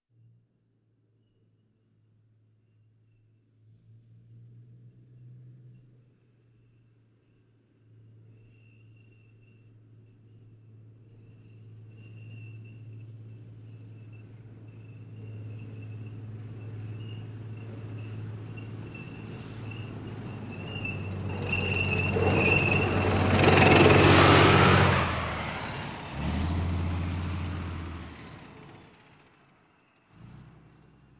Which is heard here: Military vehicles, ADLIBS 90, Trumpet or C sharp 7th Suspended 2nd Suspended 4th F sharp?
Military vehicles